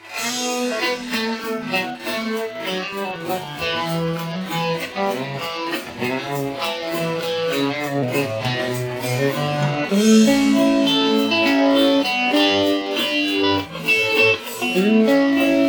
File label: guitar 1; sound source separation; ADRess